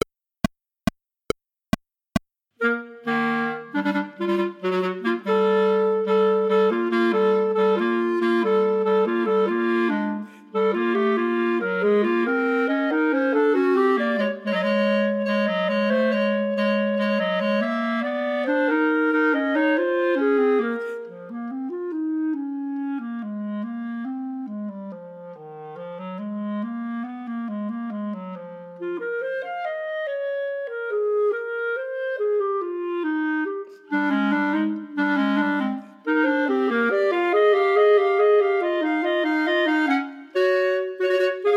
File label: 3 Pieces for 3 Bb Clarinets minus 2nd part